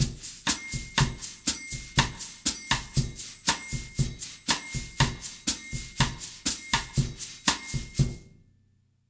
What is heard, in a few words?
120 brush, brazil bossa; USB Soundscan; AKAI